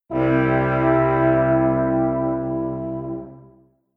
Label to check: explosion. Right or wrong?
Wrong